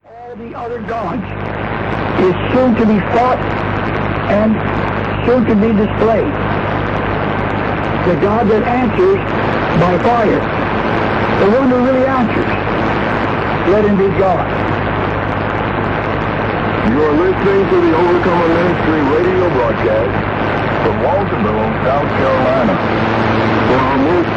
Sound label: 5900 kHz; The Overcomer Ministry; Degen DE 1103; telescopica indoor; St. Ursen